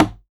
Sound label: Plastic Lid, household rhythm samples hits